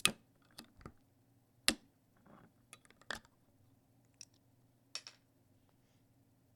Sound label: Bottle open